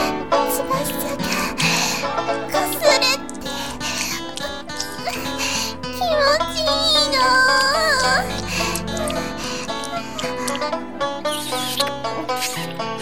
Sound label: banjo finger country